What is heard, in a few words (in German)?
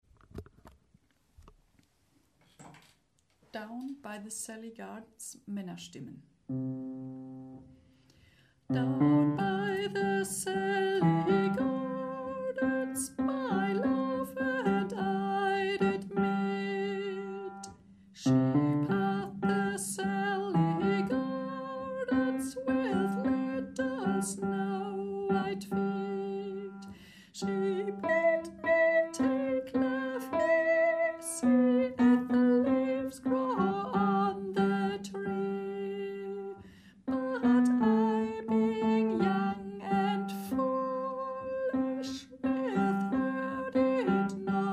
Bass, Chor; Down by the Salley gardens; chor ag